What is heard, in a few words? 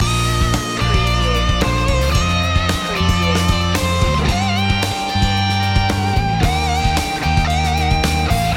city escape loop chorus solo no piano